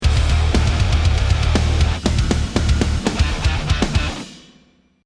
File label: deathcam